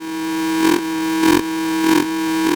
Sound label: Science Fiction Sci-Fi Electronic Scanner